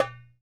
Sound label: Dumbek, World Percussion One Shots; Sony CS